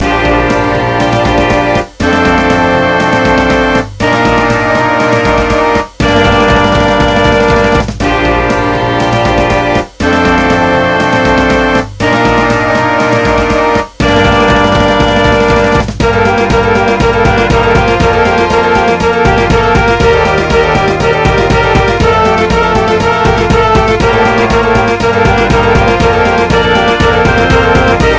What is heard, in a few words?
Marching band